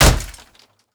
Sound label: Door Hit; MinorHallutinationsSounds1